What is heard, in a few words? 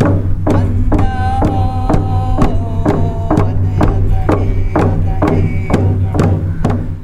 singing